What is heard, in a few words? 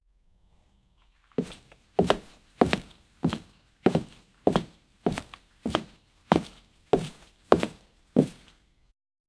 priest wooden sandal slow